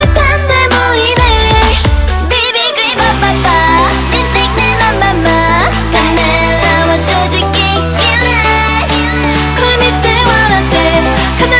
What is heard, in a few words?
japanese ringtone